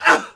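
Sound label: Swordsman Hit Vox; Civ4 warlords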